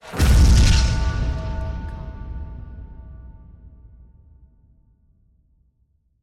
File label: anime explosion metalic